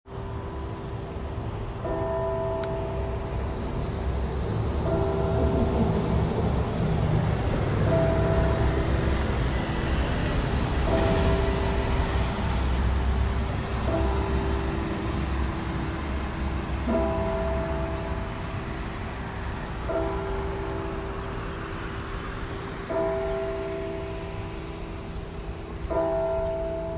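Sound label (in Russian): церковные колокола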